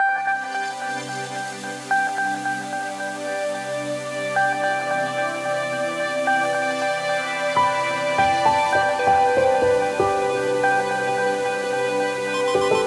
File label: Music Sunrise, alarms; LG; G2x-P999DW